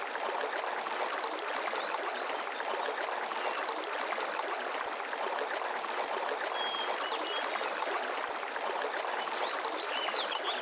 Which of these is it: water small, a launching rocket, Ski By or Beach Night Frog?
water small